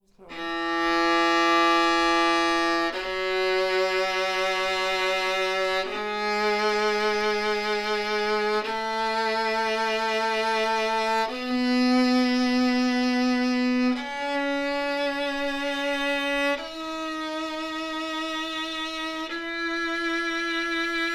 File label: Scale With Bassbar